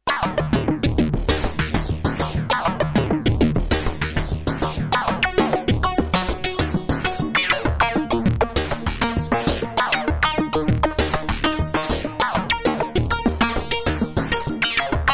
pan, synth access virus

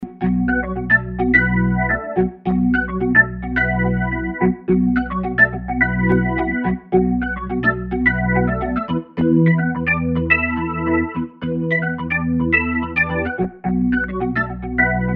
organ b3 progressive, korg n364; organ: b3 hammond; main CPU upd 70433 (V55PI) manages 64 voices polyphony based on Korg AI2

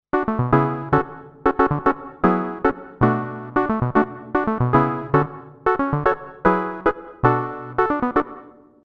SYNTH funky synth, Roland JD800

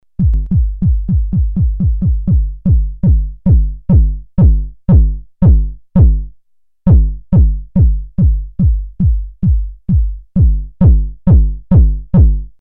harmonics, jomox mbase 11

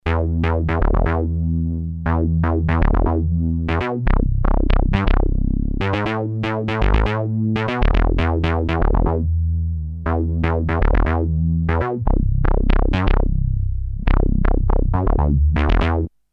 synth moog, emu carnaval; Synth Minimoog; Motorola 68000 CPU (mc68hc00fn10) system handles up to 32 voices polyphony

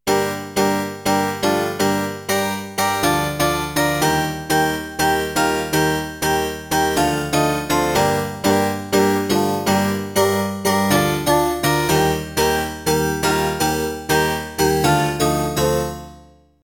edm, yamaha dx 5

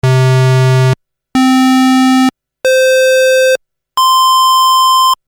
"Square" created by ADDITIVE, Korg DSS-1; main core based on 8 bits CPU Intel 8085 manages 8 voices polyphony

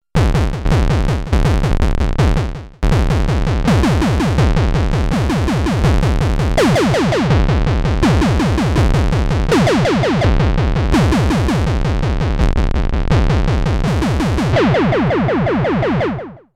drum, Drum: analog; MFB nanozwerg

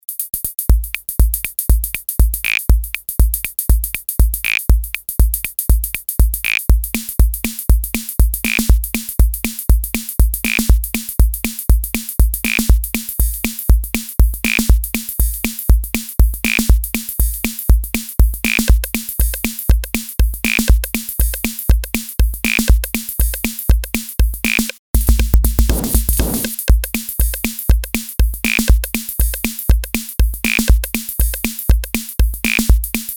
mcp60 samples, eko ritmo20

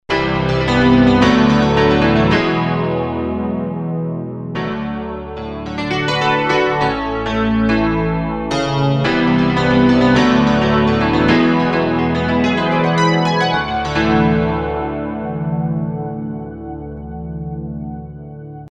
piano reverb, korg n364

